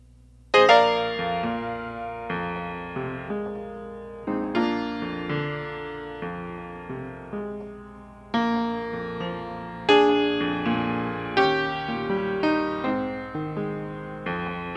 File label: piano only